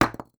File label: masonry hit